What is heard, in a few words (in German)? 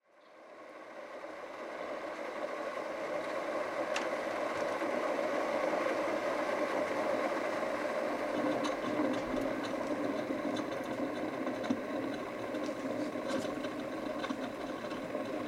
kaffeemaschine mit pad